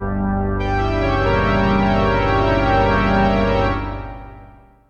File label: accent, musical; 1000fx